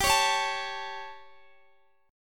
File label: G Suspended 2nd Flat 5th G, Guitar, Open D